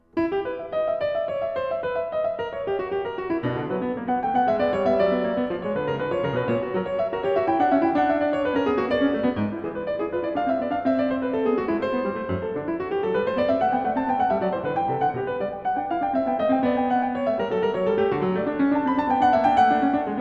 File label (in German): Fuge e-Moll; BWV 855; J.S. Bach; Das Wohltemperierte Klavier Book 1